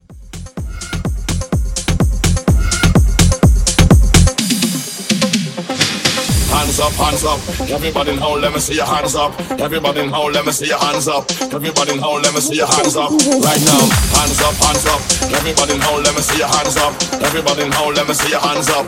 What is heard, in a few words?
Club Jungle 126